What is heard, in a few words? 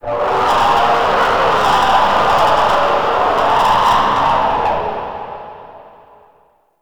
Resonating Didgeridoo; Ambience; Warframe; Shadowgrapher